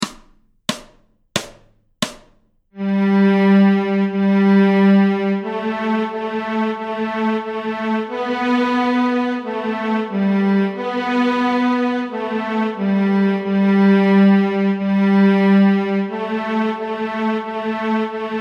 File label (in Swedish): Stråk G, Fiol